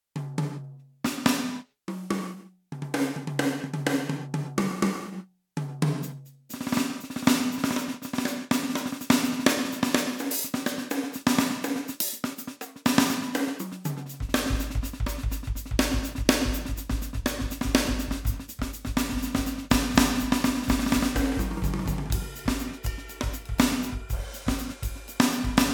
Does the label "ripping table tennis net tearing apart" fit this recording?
No